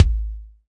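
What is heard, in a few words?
Kick Electronic Boom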